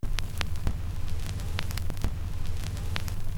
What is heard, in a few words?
Crackle Loop, Vinyl Noise